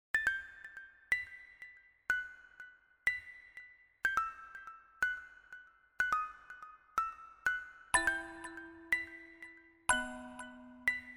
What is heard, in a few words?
music box